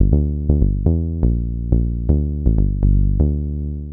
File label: 122 BPM Bass Synth F maj D min Tonic G; Pro Bass Synth Studio Kit; MMB